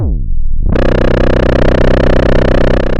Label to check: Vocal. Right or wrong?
Wrong